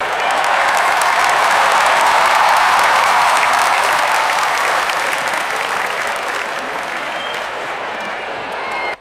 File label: distant muffled stadium crowd applause